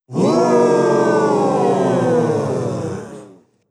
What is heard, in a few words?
Crowd Laughs And Reactions; people; applause